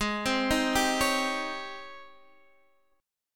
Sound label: G sharp Major 11th, Guitar, Half Step Down